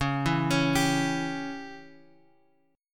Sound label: C sharp Diminished C sharp; C#dim Chord {X 4 2 X 2 3} arpeggiated